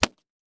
Horror Impact Body Whipping With Belt

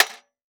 Impact Sheet Metal Hit By Copper Pipe

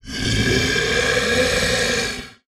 Animal Reptile Alligator Breath; animals; AdobeCC

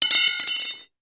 Horror Scary Pipe Metal Solid Dropping On Cement

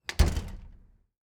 Household Screen Door Close

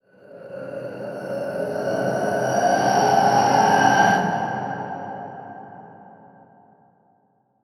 Production Element Title Transition Demonic

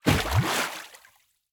Liquid Water Water Hand Splash Single

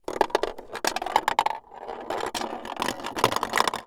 Household Ball Drop And Roll On Plastic Toy

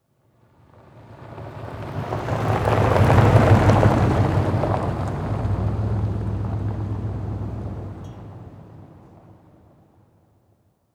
Car Drive By Dirt Road Slow; transportation

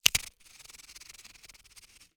Tape Scotch Tape Pulled From Roll, Household

